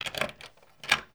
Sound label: Door Key Correct